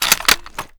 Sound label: ax50 bolt forward, weapons; albanyknight; css